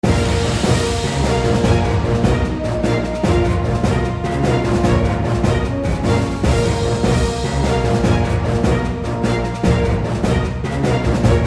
Raid Boss; Mist Train Girls

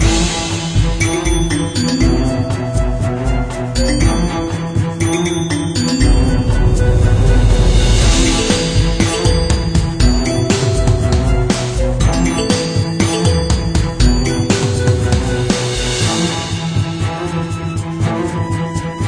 battle boss

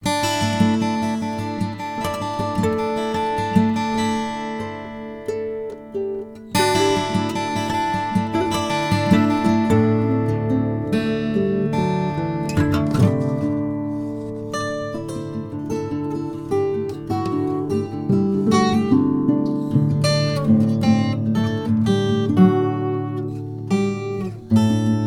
Camp Guitar A

